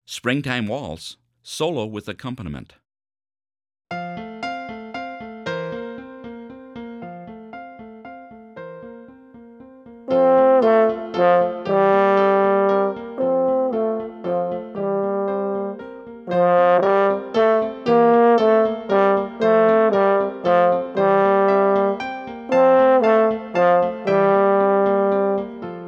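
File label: springtime waltz solo with accompaniment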